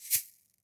Double Studio Shaker; Lofi

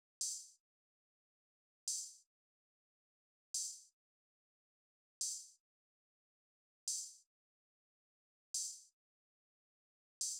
Drum Loop 144 BPM Open Hat, Hip Hop; Drum Loops; Loop Stems; Cymatics; Late Drum Loop

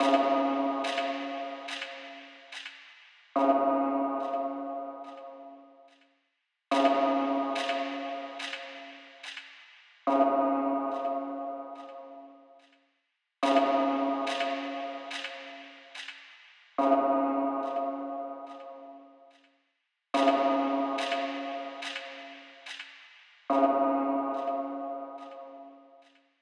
143 BPM C# Min FX; Cymatics; Balaclava

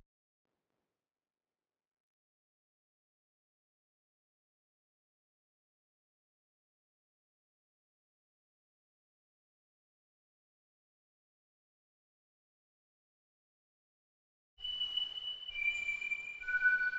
132 BPM B Min Whistle, melody loop stem; Trap; Loop Stems; Cymatics; 2022 Melody Collection - Trap Pt 1; Atlanta

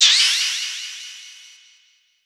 Cymbal, Drum One Shots; Reset; Hip Hop

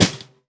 FX Snare

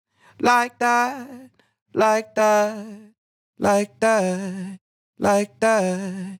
Sung Phrase Dry, 150 BPM F# Min; Like That; Cymatics; Euphoria Vocal Sample Pack

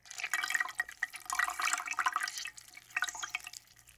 Squeezing Water; Fairlight Sound Lib

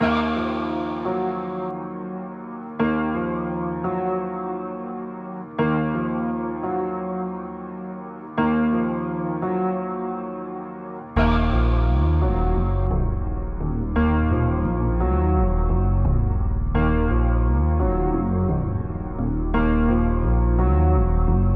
Simple Melody Loop - 86 BPM D# Min; Cymatics; Oracle Sample Pack

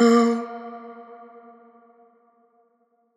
Wet One Shot - A#, Tonal Vocal; Cymatics; Euphoria Vocal Sample Pack

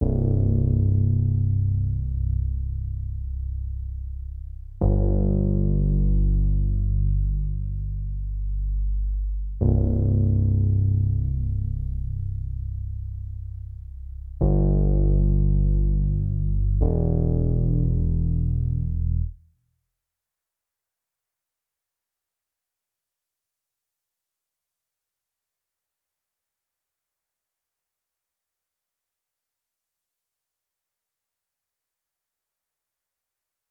100 BPM E Min Bass, Ambient; Melodies; Loop Stems; Cymatics; GHOST (Beta Pack); Above It All

